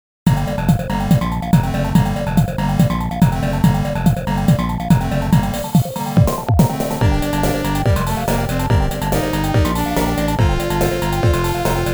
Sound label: YM2203; Role HitOut